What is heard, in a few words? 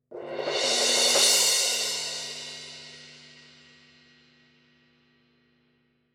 formula 602 classic sounds 16 medium roll; paiste